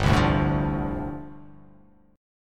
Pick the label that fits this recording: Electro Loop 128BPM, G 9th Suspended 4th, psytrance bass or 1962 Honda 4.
G 9th Suspended 4th